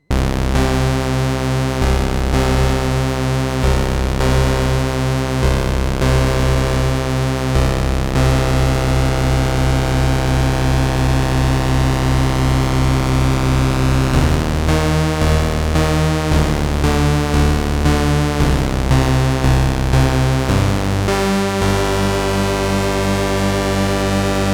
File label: JU-06A Puls Saw PWM Bass